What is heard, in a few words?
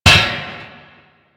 Metal Pipe Drop; Warframe; Ambience; Nineteen Ninety Nine